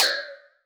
Steam Machine, Drum Reverbs, IR Samples; Convolution; NI Kontakt 5

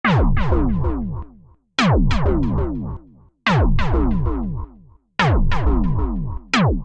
F Minor 140 bpm, Bassline; MIDI Clips; Tonal; Eon Flux; Skitter and Step; Ableton Factory Packs

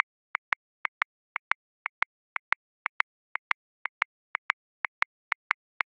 Swing Waltz 3/4, Grooves; Drum Booth; Ableton

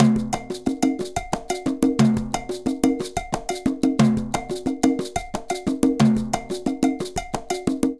Fusion 6 8 120bpm, Latin Percussion; Ableton; Factory Packs

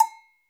Agogo Low, Latin Percussion; Drum Hits; Bell; Ableton; Factory Packs

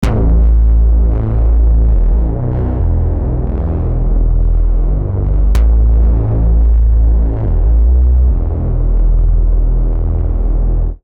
Grey Panel Reso Bass A Minor 87 bpm; MIDI Clips; Tonal; Bassline; Ableton; Factory Packs; Chop and Swing

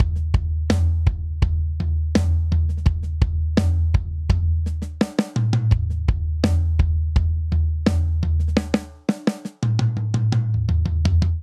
Straight Eighths Rock Buildup 84 bpm; MIDI Clips; Ableton; Factory Packs; Drum Booth

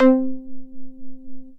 Unjazzy Pluck, Analog, Guitar & Plucked; Ableton; Factory Packs; Synth Essentials

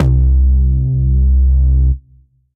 Grey Panel Bass; Ableton; Factory Packs; Chop and Swing

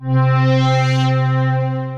Swell Pad; Synth Pad; Ableton; Factory Packs; Synth Essentials

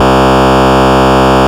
Pitfall C1, Glitch Toys & LoFi; Ableton Foundation FreePacks

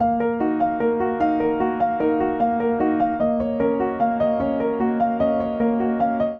Ostinato D# Major 150 bpm, Upright Piano; Last Minute; Upright Piano by Spitfire Audio; Ableton; Factory Packs

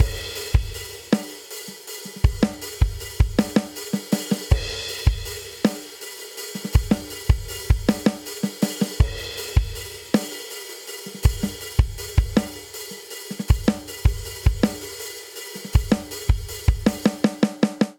Straight Six Rock Chorus 6/8 80 bpm, Drum; Ableton; Drum Booth

